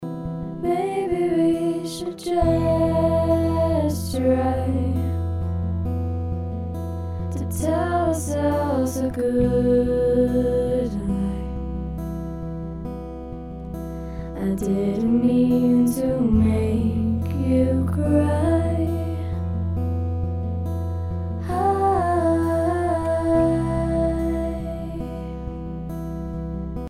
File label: i love you phase